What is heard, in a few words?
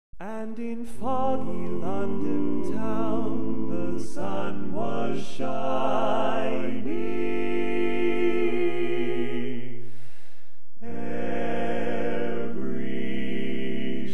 Tenor, barbershop tags